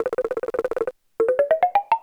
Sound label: Giant Dry Bones; New Super Mario Bros. Wii